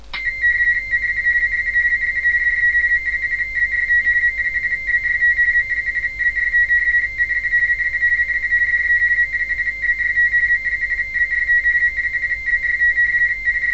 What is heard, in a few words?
rtty